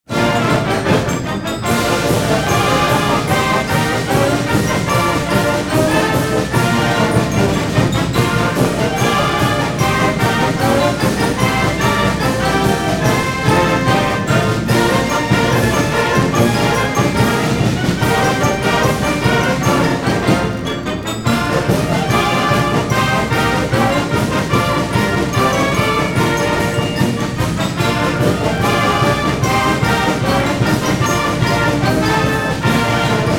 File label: fight song; Northmont